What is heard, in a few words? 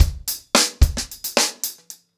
Hip Hop 110BPM, acoustic drums drumloops